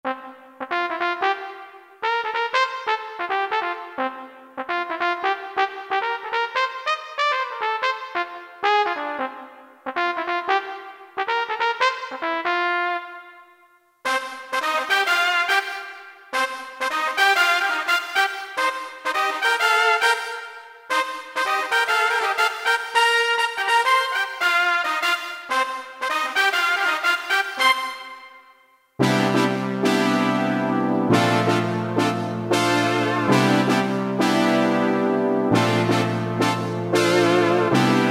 Brass Sfx, Roland EM-10

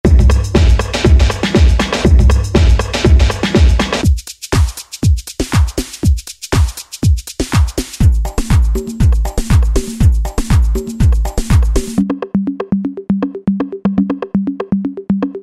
Phrase Menu, Techno Collection; Roland SR-JV80-11 Techno Collection

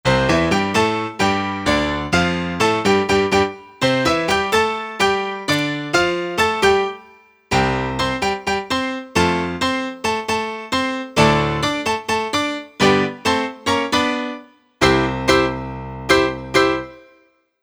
Latin Piano

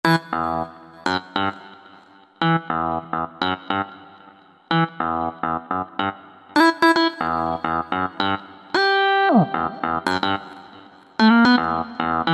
Otto Tune, Roland V-Synth

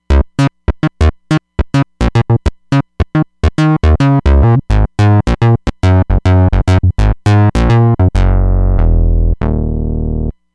MG+SubOsc Bs; Roland Fantom XR

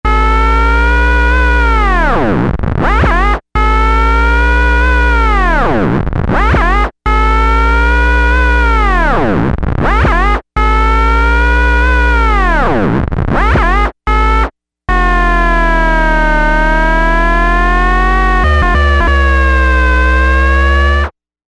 Cpu Cycles

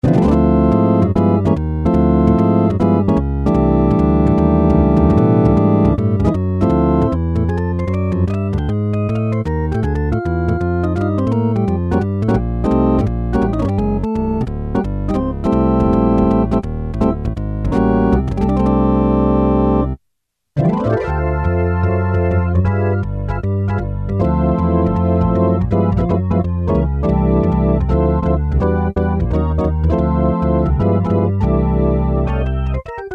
jazz organ, Technics SX-K450 Poly Presets

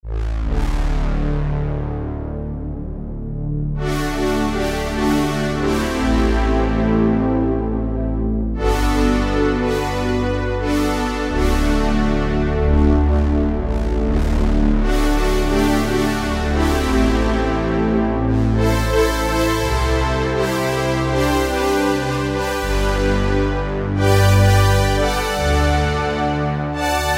Big Jupiter, Kurzweil K2000; Kurzweil K2000 V3

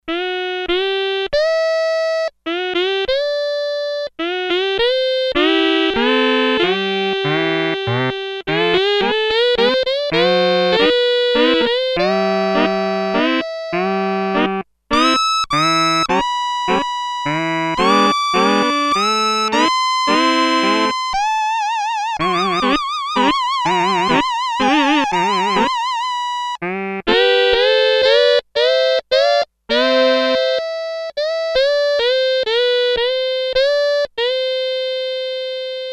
Sequential Circuits Six-Trak; 79 Golliwog Jr; Factory Programs

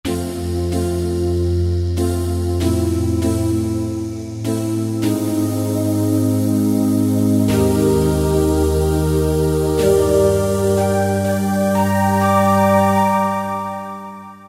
Outhere Pad, Roland SR-JV80-06 Dance

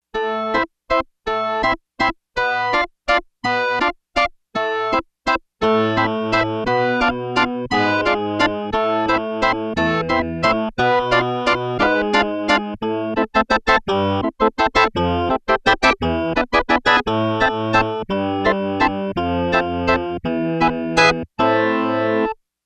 Organ, Yamaha TX802; Factory Patches; Voices

